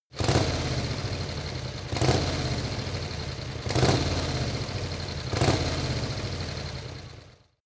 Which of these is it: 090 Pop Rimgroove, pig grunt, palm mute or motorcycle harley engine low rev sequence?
motorcycle harley engine low rev sequence